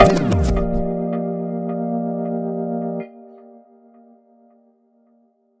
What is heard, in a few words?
Retry Jingle; Friday Night Funkin' River Date Mod